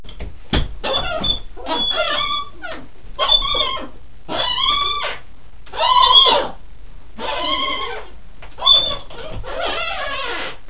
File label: seasaw; My Animated Word Book